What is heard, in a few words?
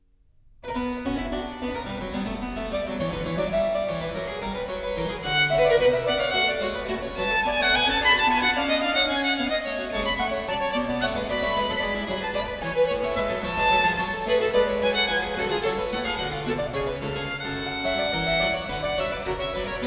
Sonata No. 4 in C Minor, Allegro, Violin and Harpsichord; BWV 1017; Bach; J.S. Bach